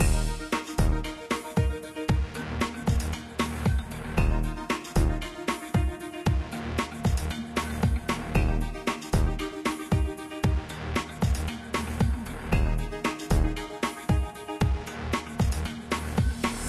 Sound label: Boat Minigame; Little Big City 2